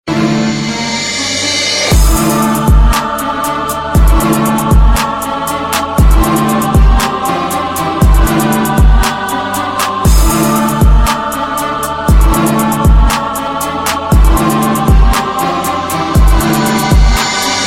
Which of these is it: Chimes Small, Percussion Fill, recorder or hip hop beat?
hip hop beat